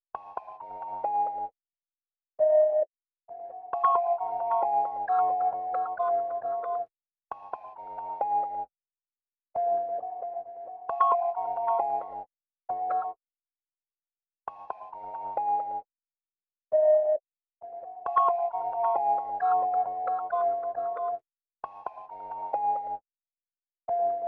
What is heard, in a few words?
134 BPM A# Min Lead; Cymatics - 2022 Melody USB Expansion; Tenebris